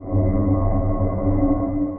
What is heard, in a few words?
Dark Textures; Galactic Fear; Multisampled